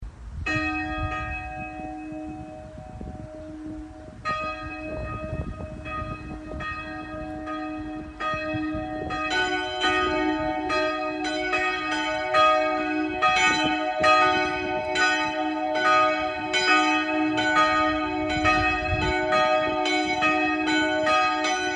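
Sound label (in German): Tonaufnahme dis2 fis2, mehrstimmige Geläute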